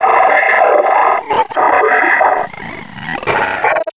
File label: zombine idle; scmod